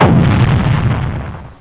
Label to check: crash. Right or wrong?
Right